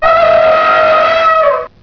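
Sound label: elephant battle; sf effects; sinfar haks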